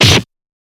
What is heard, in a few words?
Kung Fu Fight Sounds; Kung Fu Fight Sounds Pack